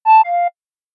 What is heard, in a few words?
notification; samsung Aleart